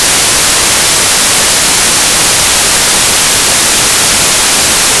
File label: noise